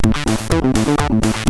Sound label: Intro, sid MOS6581R4; Rebels